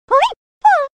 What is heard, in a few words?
Poliwag